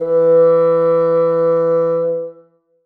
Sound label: bassoon e3; Sonatina Symphonic Orchestra